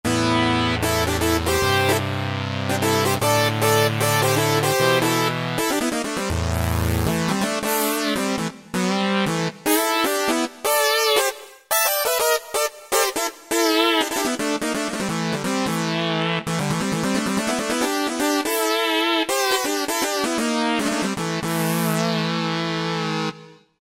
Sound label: Analog 5th Lead, Roland JD-990; Factory Patches